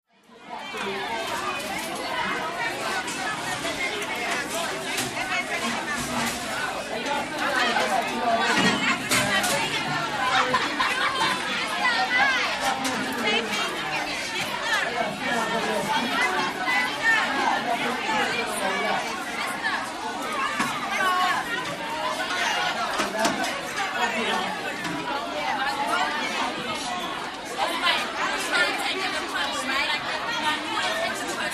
RESTAURANT Background CAFETERIA: School cafeteria, noisey, middle school, New York.; restaurants & cafes